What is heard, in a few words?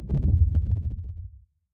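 movement low whoosh; smite; god atlas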